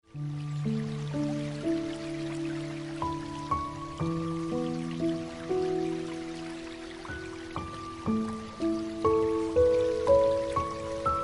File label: Piano Music